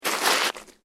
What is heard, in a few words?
SNOW SCRAPE; Material components for constructing more complex sounds; Sound Effects:Weather